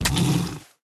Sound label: Tiger Wound